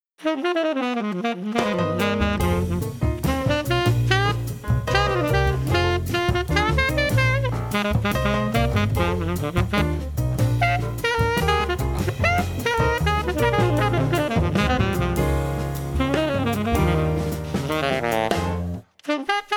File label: lounge jazz saxophone lounge